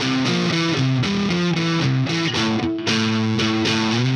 Melody Riff Guitar, Indie Rock

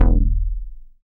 BAS MKS-50, Roland

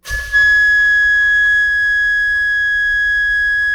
FLUTE FF G#6; Greytsounds; Philip Wolfe David Crozier's Saxophones

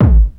kick techno, drums; Techno Trance Essentials

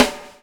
BUBBLE; Spectrasonics - Backbeat

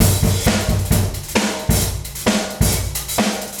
DRUMMERNE 132, OFFMIKE DRUMS

